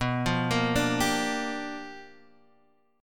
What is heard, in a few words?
G Minor 7th, B; Gm7/B arpeggiated; JGuitar